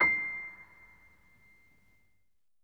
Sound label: acoustic piano, stereo; InVision Interactive; Miroslav Vitous